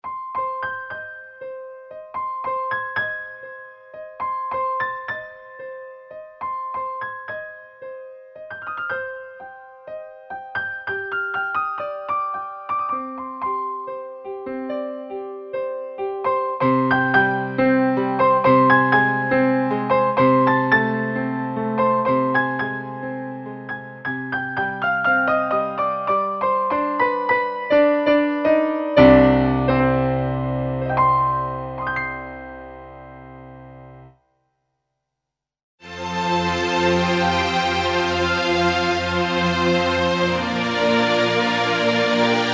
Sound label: Orchestral, Kurzweil K2xxx; K-Works